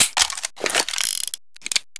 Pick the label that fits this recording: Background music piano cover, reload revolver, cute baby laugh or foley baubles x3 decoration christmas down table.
reload revolver